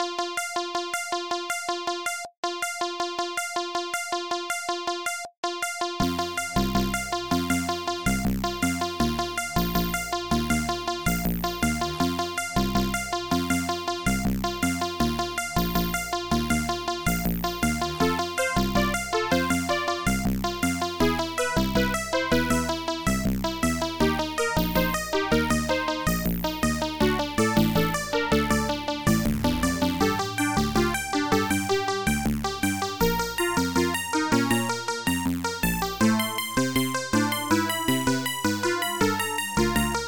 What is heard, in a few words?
126 BOSSA